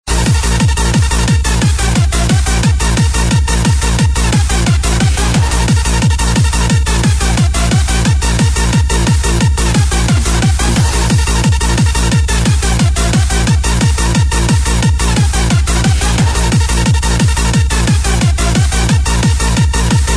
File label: Techno Beat